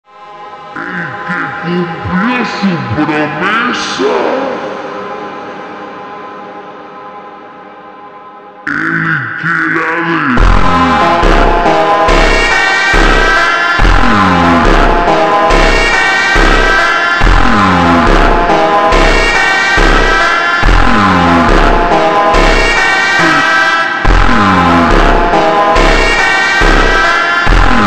funk ultra slowed

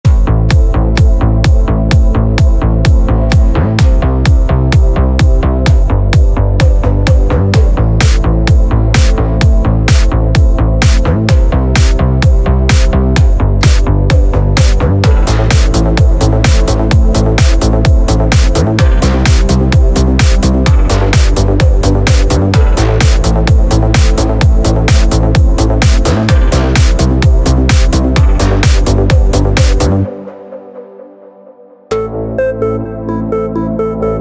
disco house techno; мощная танцевальная

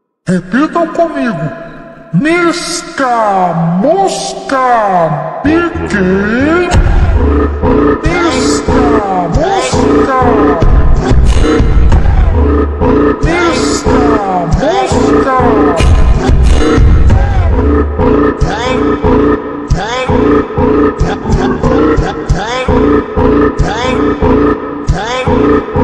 funk slowed reverb

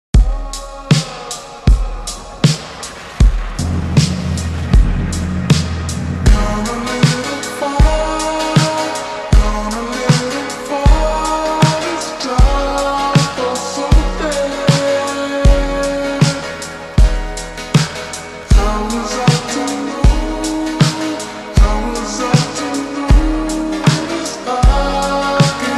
slowed reverb

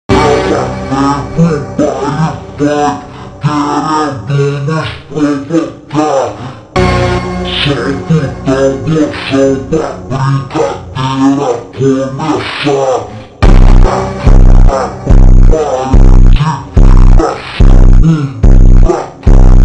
super slowed reverb

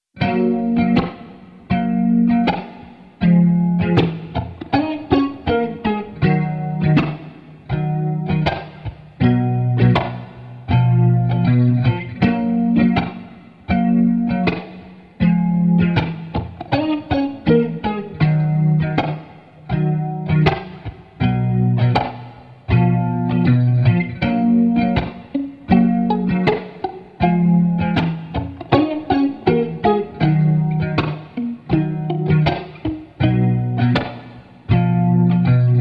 instrumental

